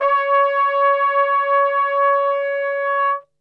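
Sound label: C#2, Flugelhorn, Brass; Best Service; Brass Super Section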